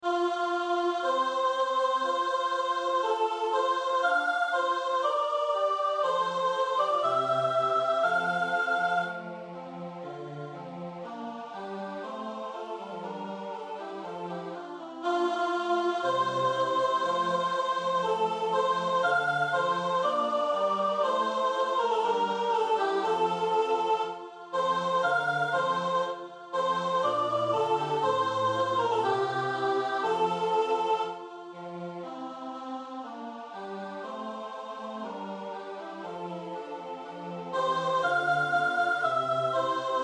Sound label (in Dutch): sopraan; Motetten; Aichinger